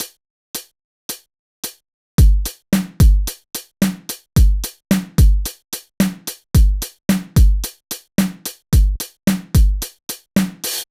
Beat 110bpm, kick variations for guitar practice drum; kick variations for guitar practice drum foundations vol 1